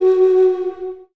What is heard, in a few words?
flute; Winds